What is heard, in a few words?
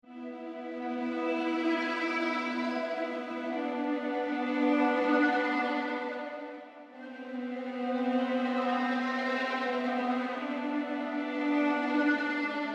Rhythmic Textures